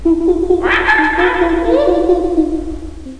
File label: jungle; Mr. Wonder's Greatest Toyshop on Earth